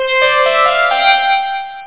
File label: Do Re Mi Fa Sol; Spark; JumpingAround